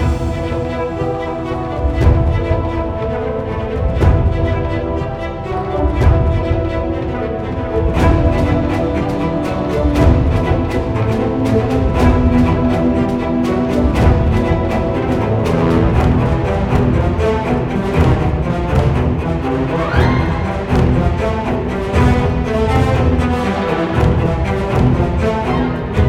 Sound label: Battle Theme Loop; Rough Conflict; Legends of Ellaria